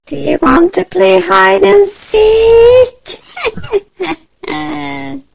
granny alert; zerosiki; slender

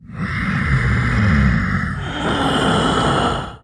idle, male zombie; nmrih; zerosiki; slender

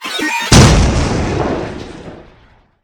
landmine explode; freak fortress 2; employee

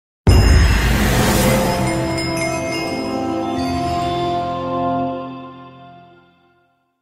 victory pig; freak fortress 2

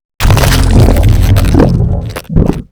forest keeper eat sound; lethalcompany; zerosiki; slender

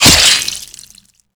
wep hit; tm ventrilo; slender

